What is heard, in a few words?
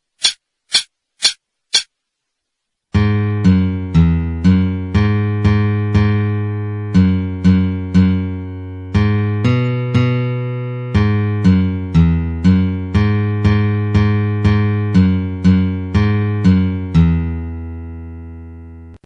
Mary Had A Little Lamb, 120